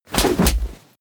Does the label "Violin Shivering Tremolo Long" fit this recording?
No